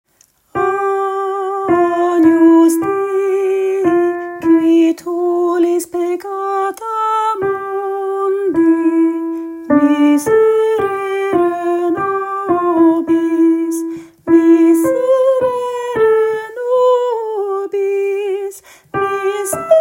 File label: Agnus Dei tenor